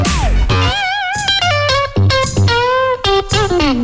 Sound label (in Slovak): gitara solo